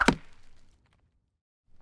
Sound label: arrow wood, attack impact